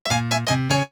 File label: Jingle Lose